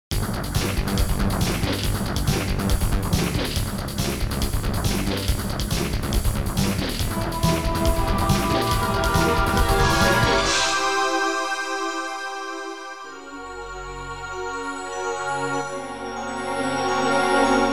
AWE32; End Level; DESCENT

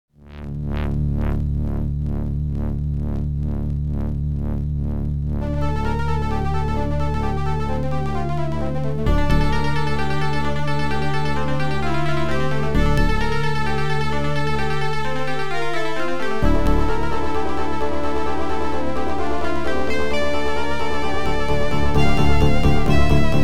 OPL2

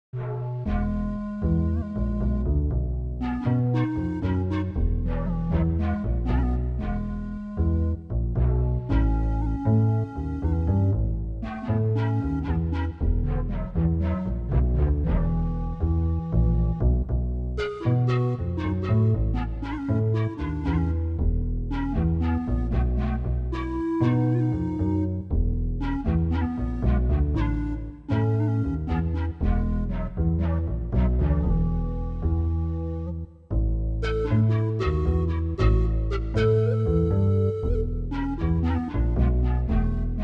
SC880; QFG3

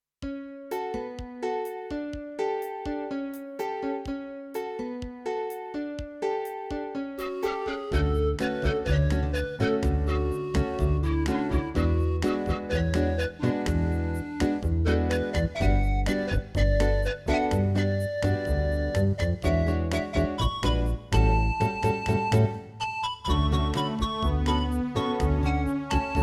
CM500 GS; LSL3

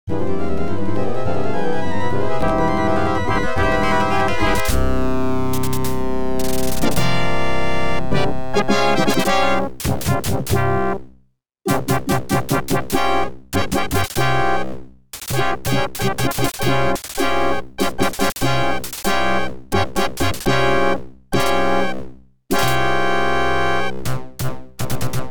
OPL2; Imperail Attack; YAMAHA OPL2; Star Wars: X-Wing

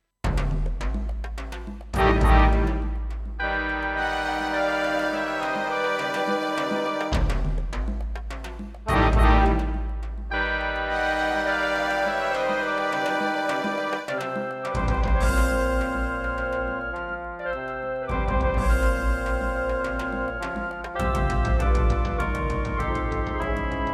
SC880; QFG3

